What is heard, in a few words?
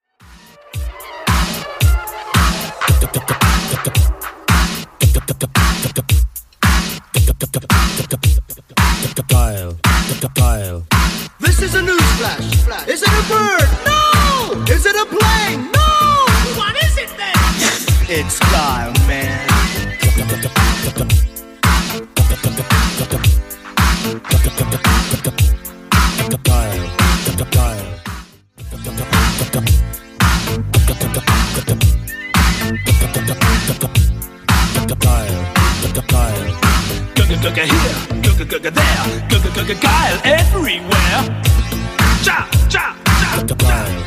80s Redrum 112bpm